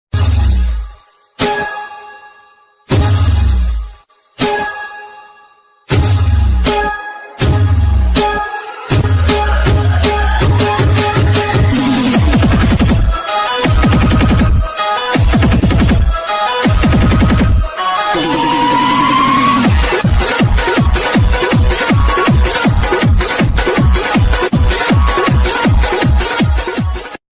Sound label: Holi Instrumental